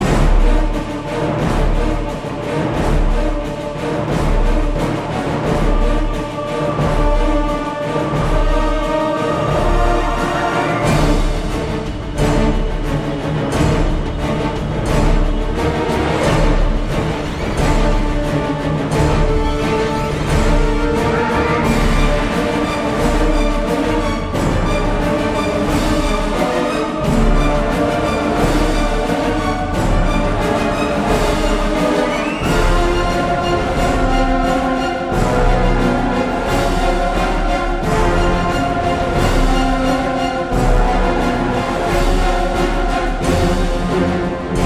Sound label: Hospital Combat Altered